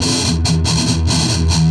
Riff, Industrial; Nasty

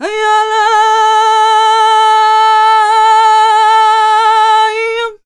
MEH1 VOX, Balkanish Traditions

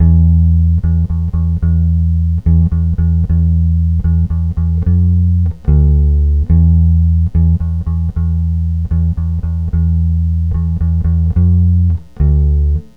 Bass E, Lounge; Zero-G Kocktail Kollection; Construction Kitz (Lounge); Volcano at Sunrise 074

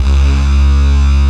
Heavy Db1; Proteus 2000; Digital Sound Factory Proteus 2000

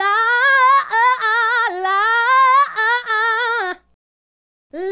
Adlib, Hip-Hop and RnB Vocals; Sonic Foundry (Sony Creative Software)

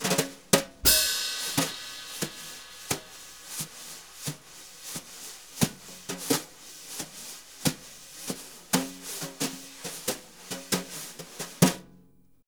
BRU, BRUSH; Univers Sons; Jazzistic

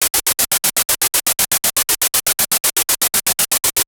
Gated Noise 120BPM; musicradar

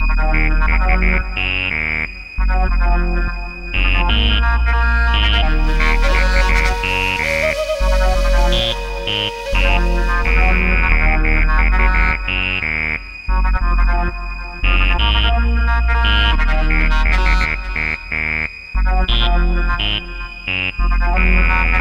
Melody, Hip-Hop

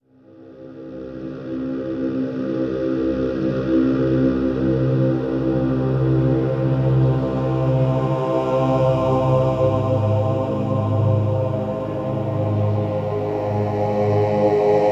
Low Complex Voice, Complex Wave; Sonic Foundry (Sony Creative Software) - James Johnson Spektral Minimalism

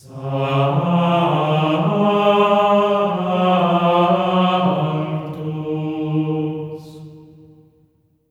SANCTU C#4; Spectrasonics; Symphony of Voices; GR SANCTU

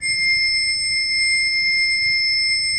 C6, Cathedral Organ; ILIO; Propeller Islands Cathedral Organ

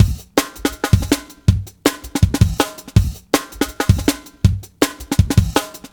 Copper 162, Jungle Straight Loops; Zero-G Jungle Warfare 1, 2 & 3

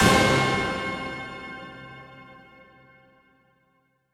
Synths Root C

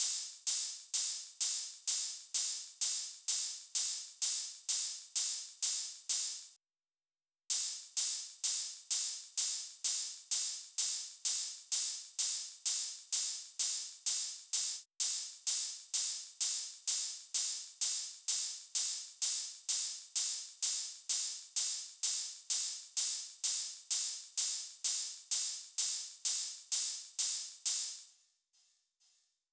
Drum Loop Ride, 128BPM; VEC5; Drumloops; Multi Loops

